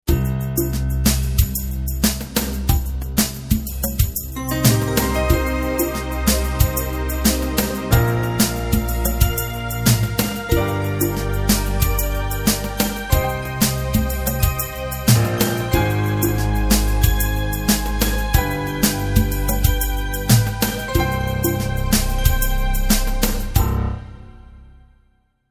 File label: Lush Layers, KORG